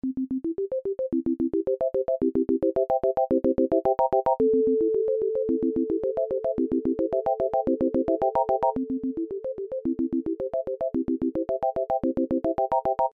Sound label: 440hz; plug-in